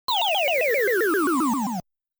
Alien Flying; gaplus

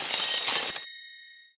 Use Stars; Angry Birds

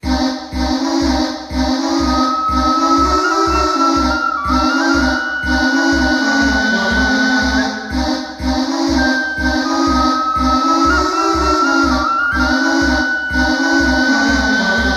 Jingle Rpg Map

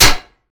shield hit; blockade classic

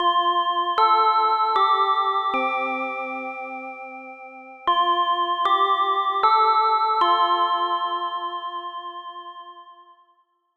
Jingle (School Chime); YU-NO A Girl Who Chants Love at the Bound of This World

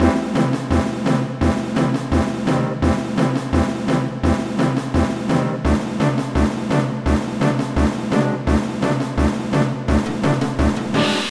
Evolution Buildup

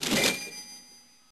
Coin; Wally wo Sagase; System 18; arcade

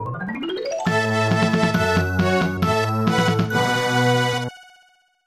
Failed; Shanghai Banri no Tyojyo; The Great Wall; SNES

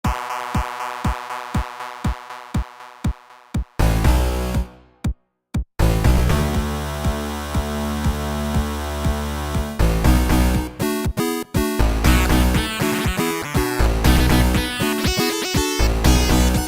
Castle Rock (8-bit)

